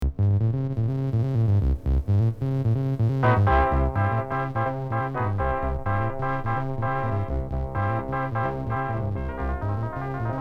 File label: Instrumental